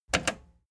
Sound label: plastic button slack click fast